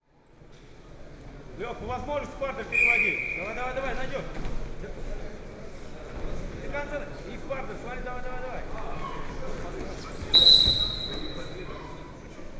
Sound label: sambo fight; sergiyev posad